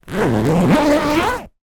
household cushion zip; zapsplat

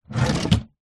household bedside table wood draw slide

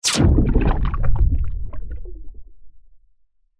bullet impact water underwater pov; zapsplat

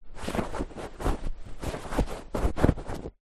household cushion handling; zapsplat

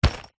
foley lunchbox fabric set down

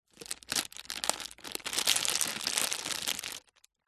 foley packet baby wipes handling rustle; zapsplat

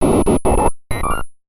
Radio Relay; martyn stonehouse

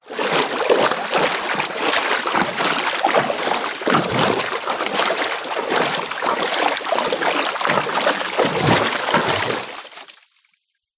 water movements swishes 1 metre deep; nature

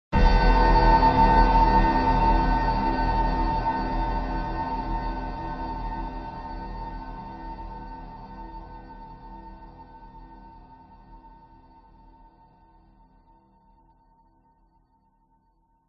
horror hit stab piano dark eerie

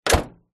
car trunk close volvo 850

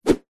foley whoosh whip swoosh fast; zapsplat

